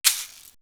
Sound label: Perc; AMG; Perc One Shots